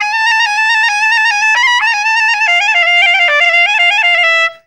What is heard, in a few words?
ORIENT CLARI; Best Service; World Colours